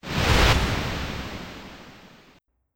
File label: cloak and dagger; tf2; spy